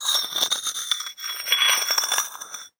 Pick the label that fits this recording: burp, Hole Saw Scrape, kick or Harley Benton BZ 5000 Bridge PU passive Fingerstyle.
Hole Saw Scrape